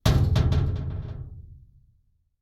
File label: ambient soundscape underground; Soundscape Overhaul